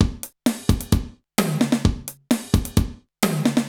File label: Alesis HR-16B (130BPM), beats